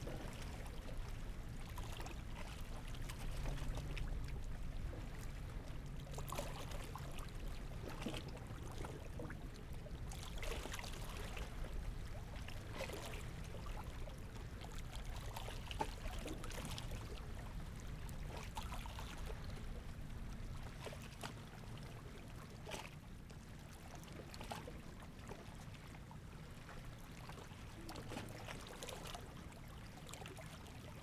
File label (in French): tour royale port toulon